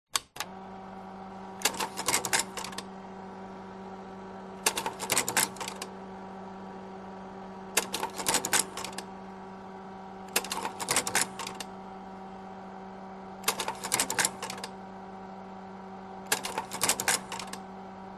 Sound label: office slide projector vintage on automatic change slides several; voigtlander perkeo automatic; 1960; zapsplat